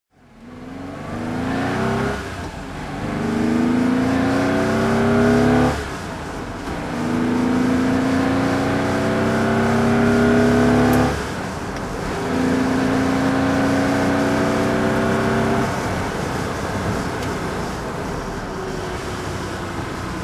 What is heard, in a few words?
ferrari 250 gte drive